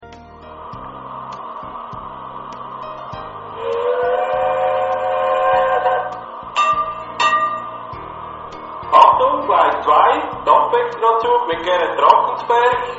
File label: Garratt Dampflok, Garratt Steam Loco